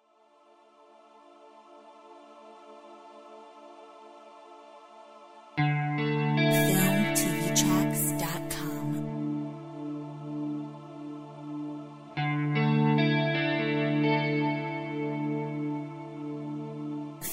Ambient; Filmtv-tracks

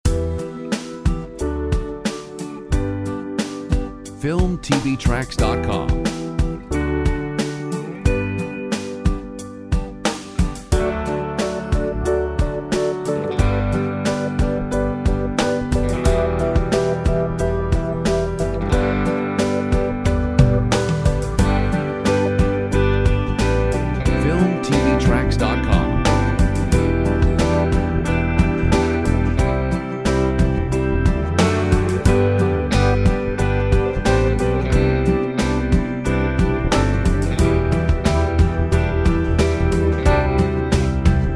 full drums no vocal

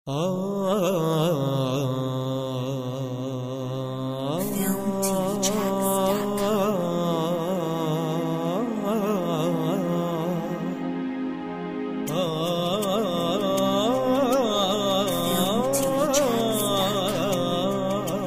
Middle Eastern Prayer; Filmtv-tracks

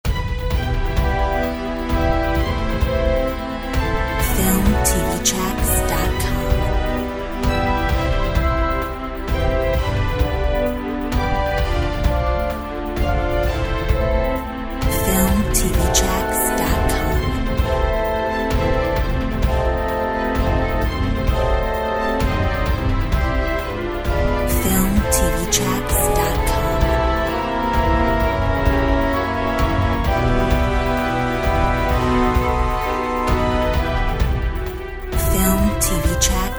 Dramatic

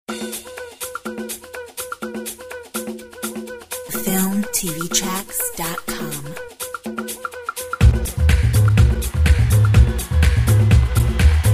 Brazilian Carnival

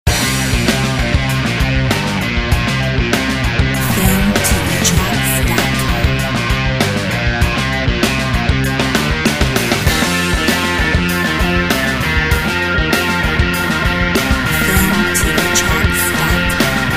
Rock

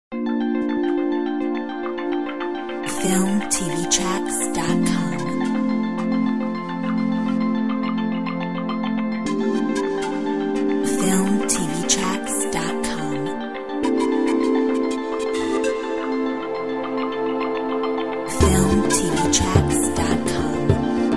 Peruvian

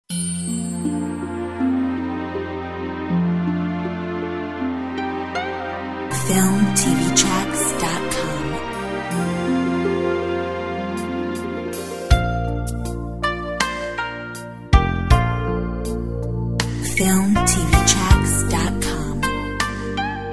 Korean Romance

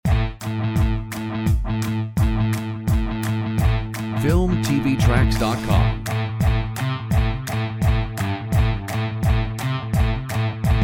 Rock Party

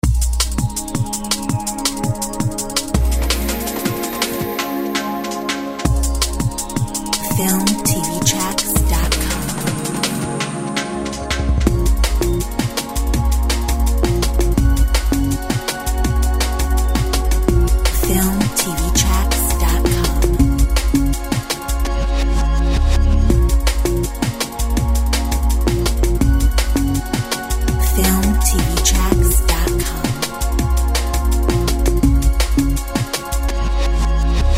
Technical process

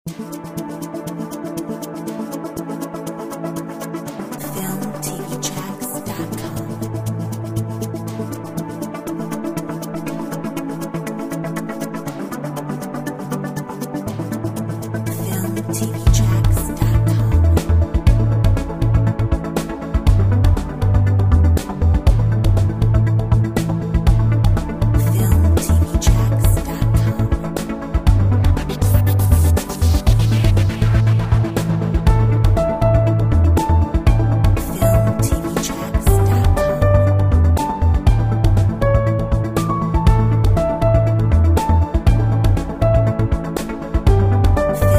Positive action; Filmtv-tracks

